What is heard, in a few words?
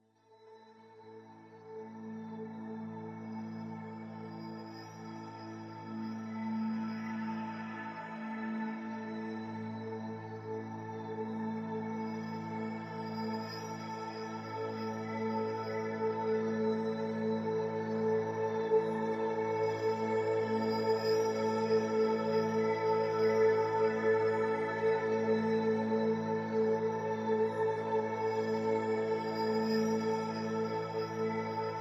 Pads Only